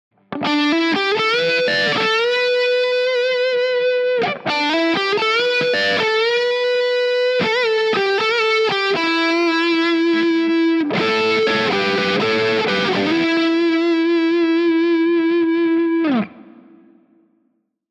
gt drive cable lead

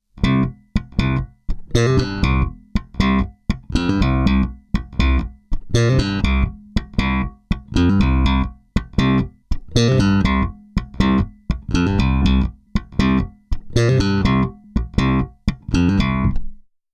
direct bass; rme comparing; uc

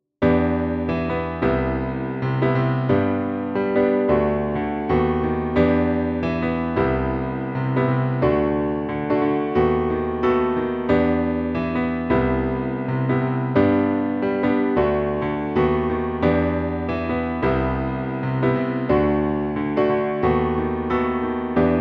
gp solo unprocessed, ivory2

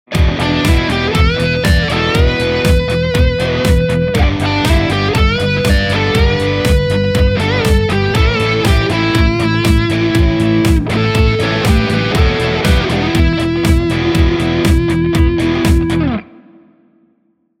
gt drive cable

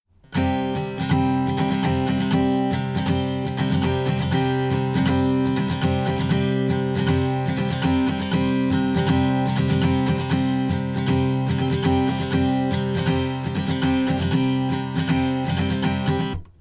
comparing direct ag; rme; ucx2

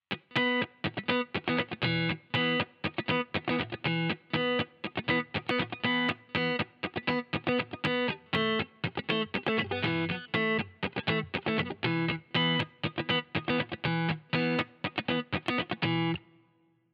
gt drive cable funk

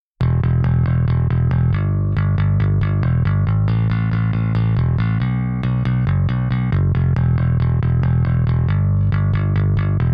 layered sound bass layered

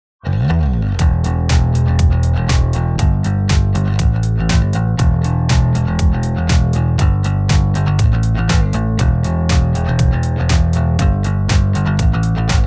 bass real pick; bass comparing

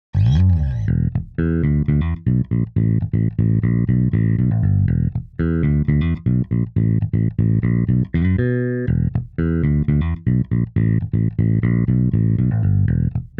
bass vst fing solo; bass comparing

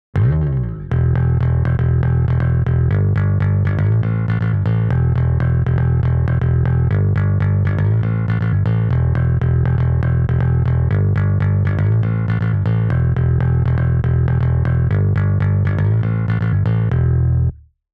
bass comparing vst pick solo

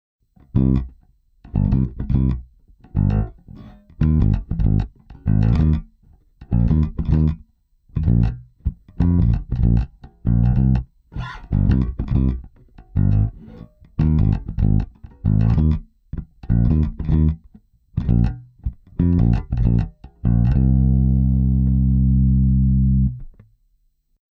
bass cable solo

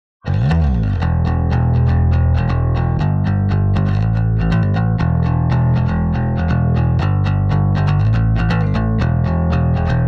bass comparing real pick solo

